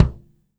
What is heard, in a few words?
BD, Drums Acoustic; USB Soundscan vol.10; AKAI; 90s sample CD; ALL BD